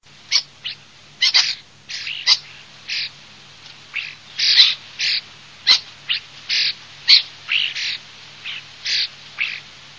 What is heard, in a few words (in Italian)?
storni gabbionata